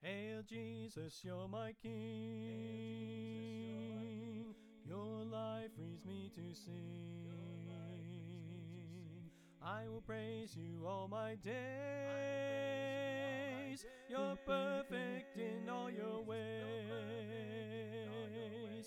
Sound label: Tenor Left